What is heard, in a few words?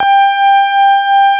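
CX3 organ G5 R, Keyboards Old School; USB Soundscan vol.09; AKAI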